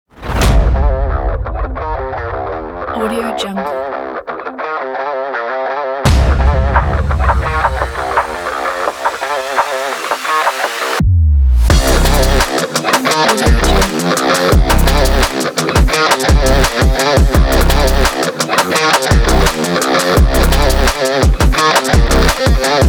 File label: punchy sport